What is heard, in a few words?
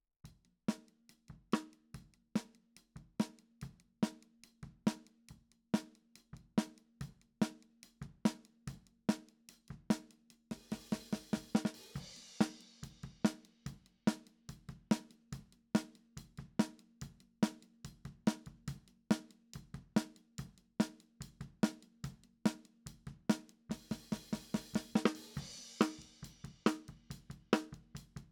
Snare Top